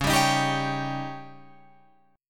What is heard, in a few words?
Db Suspended 2nd Flat 5th, Guitar, Open F; strummed